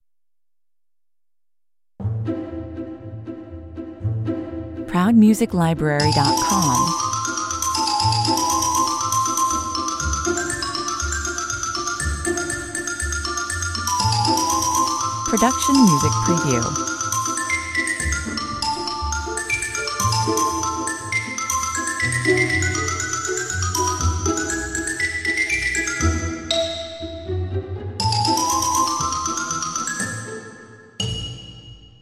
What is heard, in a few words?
quick xylophone